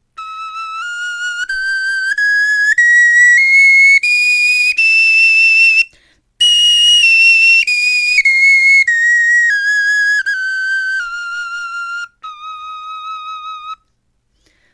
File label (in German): tonleiter, knochenfloeten